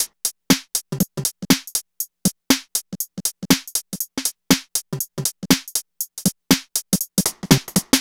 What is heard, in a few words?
NRG Break, Trance, Drums, Breaks